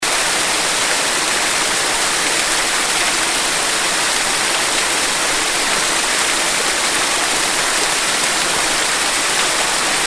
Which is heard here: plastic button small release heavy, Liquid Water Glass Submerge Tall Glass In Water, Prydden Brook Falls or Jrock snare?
Prydden Brook Falls